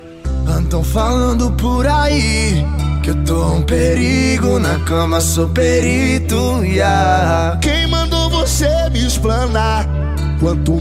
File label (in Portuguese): rap; cd rap flash sound